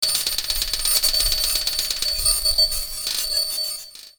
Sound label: piezo fragment